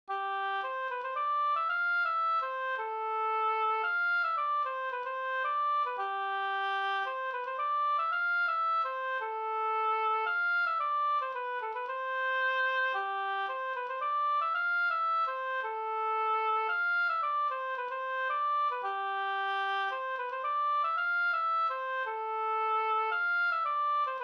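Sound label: polska C major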